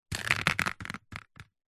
nature water drips short sudden onto glossy paper bag